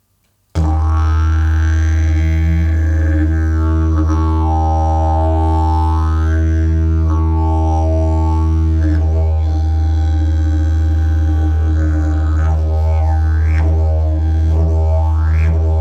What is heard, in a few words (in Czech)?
jasan, didgeridoo